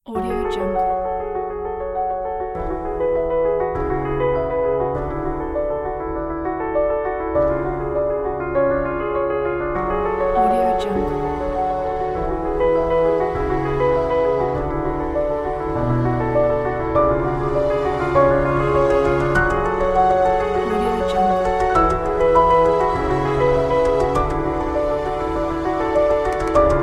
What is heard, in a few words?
inspiring classic uplifting piano